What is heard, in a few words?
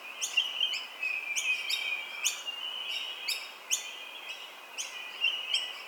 birds; Golden Crayon Sounds